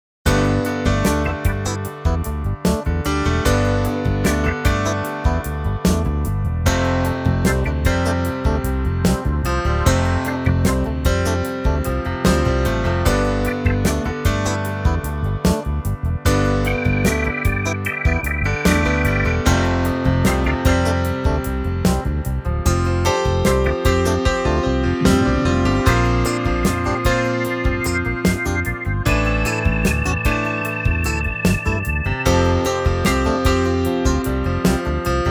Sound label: Bright